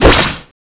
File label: magic knife slash